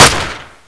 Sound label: glock18, weapons